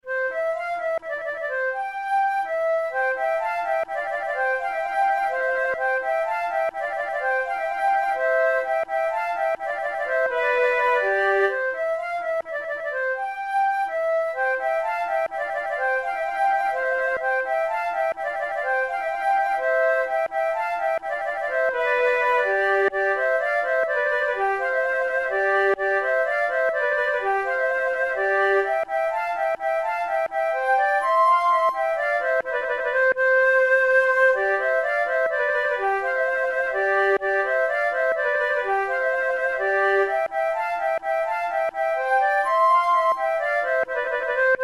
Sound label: fanfare, flute; naudot